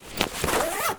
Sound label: gear armor use